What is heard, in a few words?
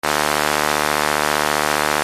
machinery